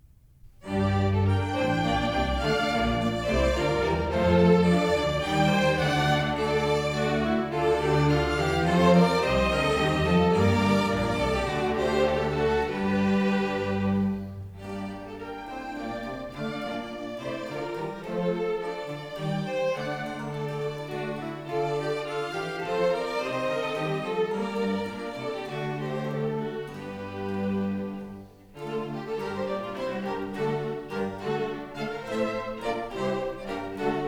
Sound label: String Orchestra